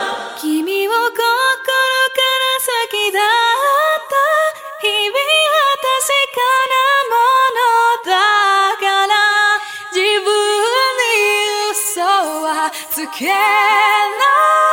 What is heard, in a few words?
Japanese Ringtone